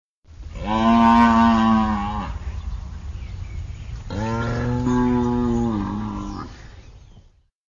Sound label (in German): musik